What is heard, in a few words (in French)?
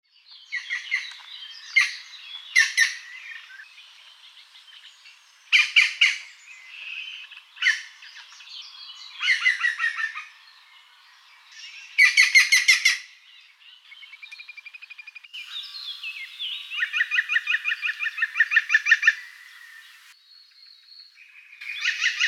Pic vert